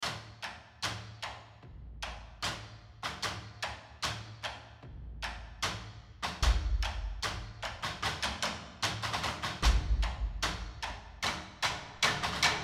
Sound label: cinematic percussion sketch; cinematic music sketches